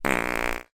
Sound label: human fart med long